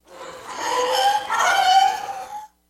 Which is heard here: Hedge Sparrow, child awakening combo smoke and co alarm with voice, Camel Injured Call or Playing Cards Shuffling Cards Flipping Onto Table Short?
Camel Injured Call